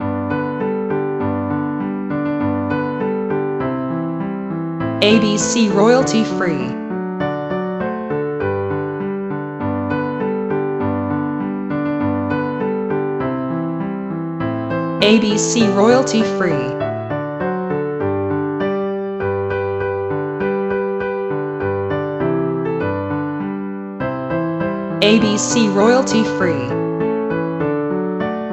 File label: Jingle Bells Piano solo